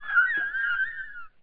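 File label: Special Photo Barcode Forest Environment Bird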